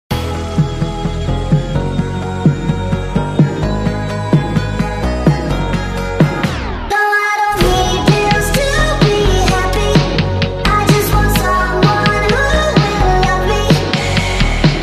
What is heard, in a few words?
electro